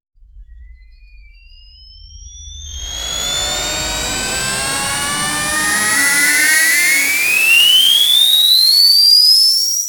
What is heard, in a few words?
anime sound of charging